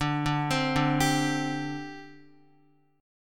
D 7th Suspended 4th, down, Guitar; D7sus4 arpeggiated

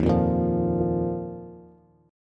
Eb Suspended 2nd, Bass; Ebsus2 strummed; JGuitar

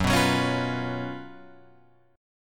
F sharp Major 7th Suspended 4th, Guitar, Open F; F#M7sus4 strummed

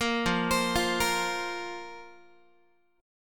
F Suspended 4th F, Guitar; Fsus4; arpeggiated; 13,13,15,15,14,x

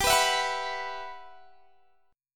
E Suspended 2nd G; strummed; Esus2/G

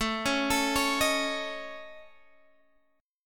A Major Flat 5th, Guitar DADGAD; chord

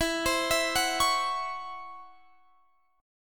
Db Minor Major 7th Double Flat 5th

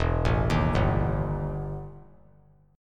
E Minor Major 7th Double Flat 5th E; arpeggiated; JGuitar

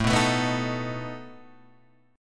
A Suspended 2nd Flat 5th A down Guitar Open G

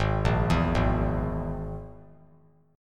Ab Suspended 2nd Sharp 5th Ab down; arpeggiated; JGuitar

